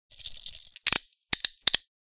Prisoner handcuffs latch closed

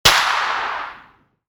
Pistol shot distant perspective

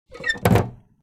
Scooter storage compartment

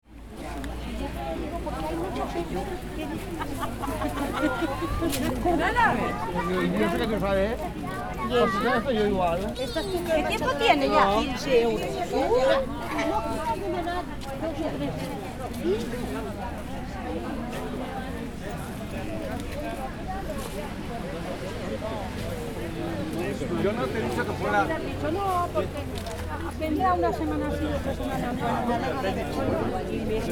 Street market ambience large crowd

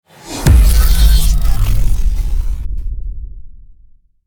Subwoofer bass impact cinematic trailer

